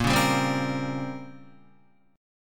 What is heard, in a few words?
Bb Minor 6th Add 9th Bb down Guitar